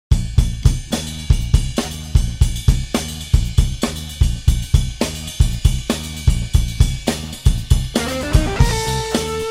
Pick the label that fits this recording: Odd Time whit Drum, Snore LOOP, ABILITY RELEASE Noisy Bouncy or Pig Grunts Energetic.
Odd Time whit Drum